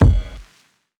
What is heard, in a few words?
HAMMER TOE KD